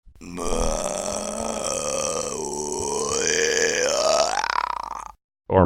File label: funniest burping ever